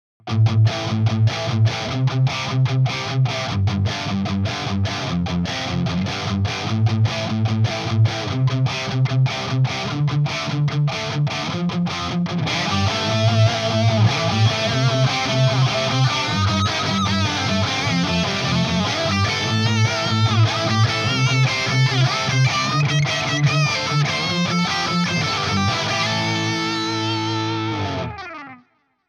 pick compare od ultex jazz xl

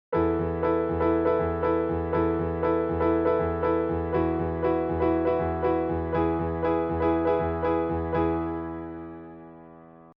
chord progression esus4 esus4 esus2 e

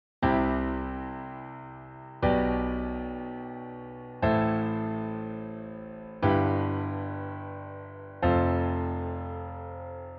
canon progression using slash chords

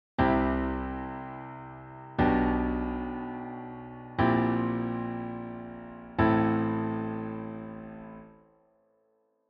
Major cliche

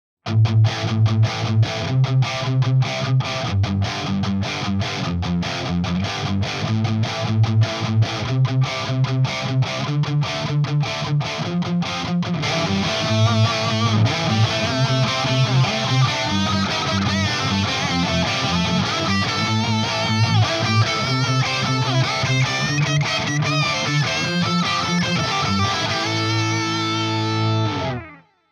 pick compare od

